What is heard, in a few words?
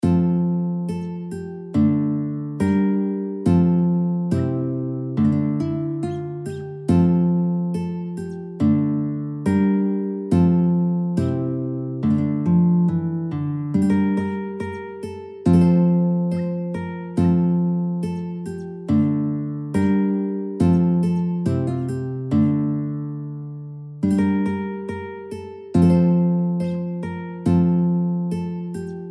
Oj gylja gylja BANDURKA